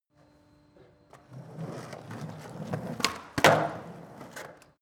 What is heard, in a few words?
skateboard indoors drive start-up